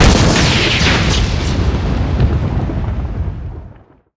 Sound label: Lightning Strike Fire Strike; Evolve; Elder Kraken